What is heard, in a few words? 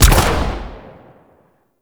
Rifle Shot; Warframe; Weapons; Zariman Rifle